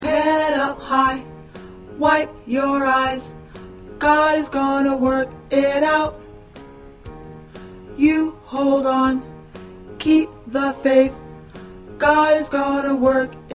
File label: Tenor, choir parts